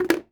ui drop can full; Rust

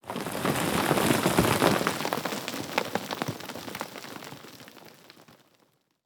impacts; World of Tanks

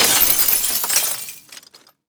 glass falling; Escape from Tarkov

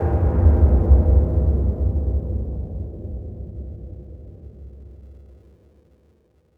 cave occasional

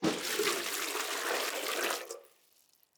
waterbucket fill container; Rust

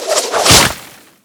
evac rope land; gulag; Call of Duty Modern Warfare

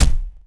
Hit Unit; Civ4 warlords

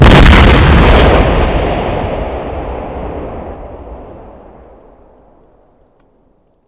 Inf Weapons; Red Orchestra 41-45

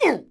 Cbmn Hit Vox; Civ4 warlords

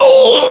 pain, voiceovers

